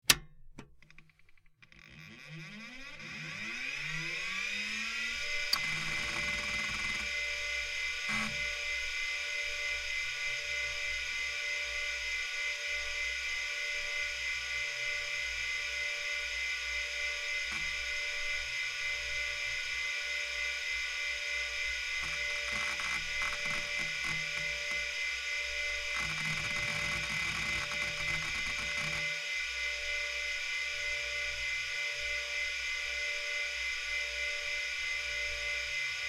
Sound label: Computer; Pc And Fan Startup, Hard Drive Access And Shutdown.; hard drive whir